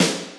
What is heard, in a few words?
Roland U110 Drums D1